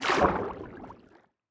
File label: underwater enter, ambient; minecraft